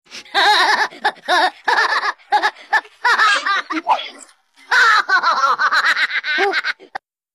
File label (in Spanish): risa; dee dee